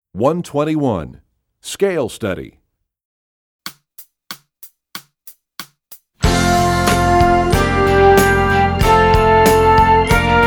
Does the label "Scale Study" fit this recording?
Yes